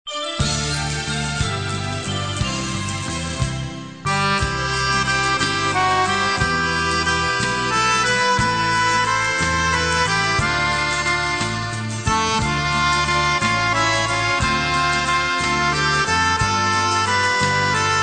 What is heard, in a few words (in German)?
akkordeon